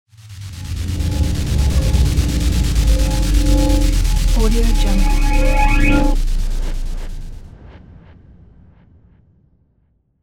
teleport

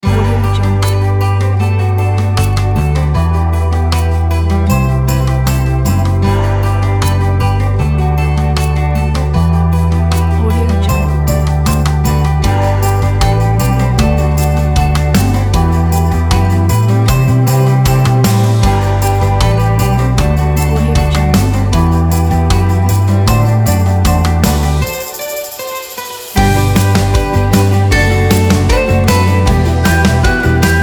folk

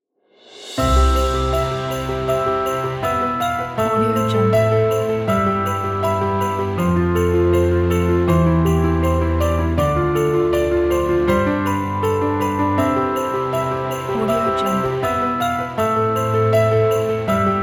cozy christmas; alexunderthesky

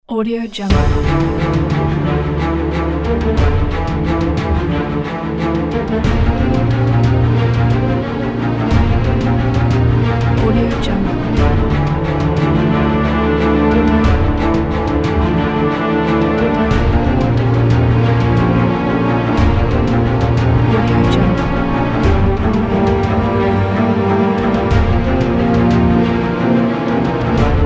epic gameplay

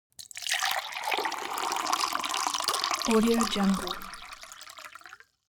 elements water glass pour hard; smartsoundfx

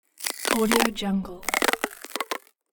removing a sticker

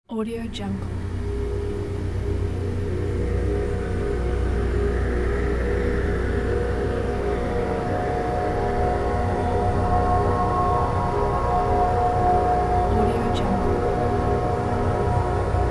deep open space

